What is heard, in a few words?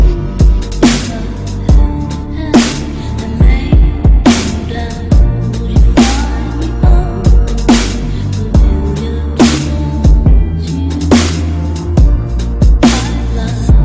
Dubstep